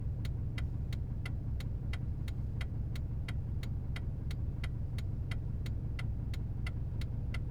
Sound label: Engine Turn Signal Subaru Forester 1989